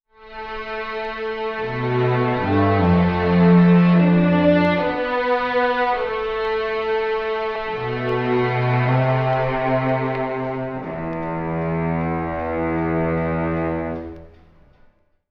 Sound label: strings, Sad; Loops